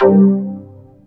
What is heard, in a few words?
VOCODER DRUM; Rubber Chicken Software Sample Bank; DRUMS + PERC